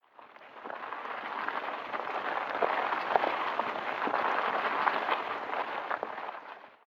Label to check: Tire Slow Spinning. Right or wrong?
Right